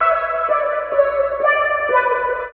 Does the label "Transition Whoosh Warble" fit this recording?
No